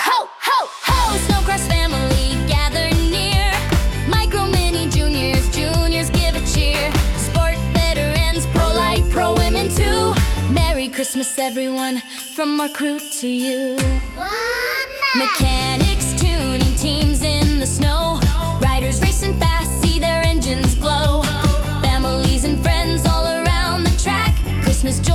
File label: SnoCross xmas jingle